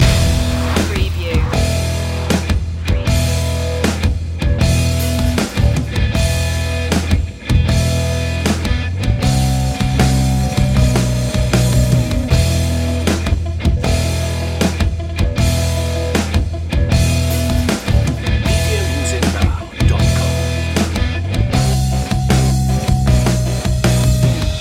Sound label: hard hero loop